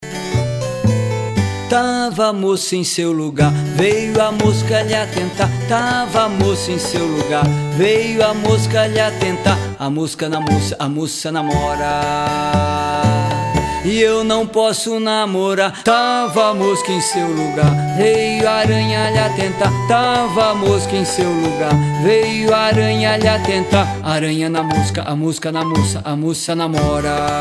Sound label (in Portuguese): Canto Acumulativo